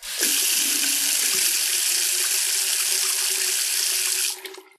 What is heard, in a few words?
water faucet